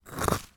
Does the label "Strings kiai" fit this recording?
No